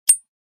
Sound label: Grenade safety pin pull; military equipment sound effects